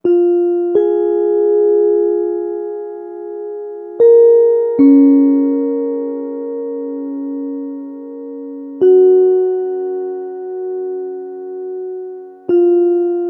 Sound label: Bell; Sonic Foundry (Sony Creative Software); James Johnson Spektral Minimalism; Sublime Keys; Bells